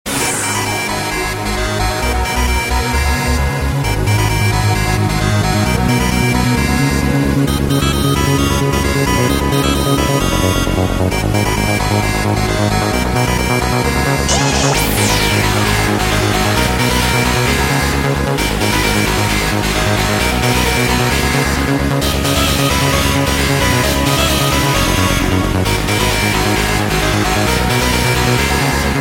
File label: Dj Mix Tone; Lawarish